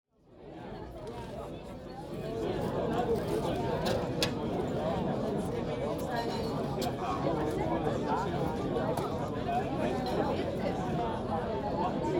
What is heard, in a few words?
Urban Binaurals Cafe Restaurant Terrace Mixed Crowds Silverware; The Sphere of Sound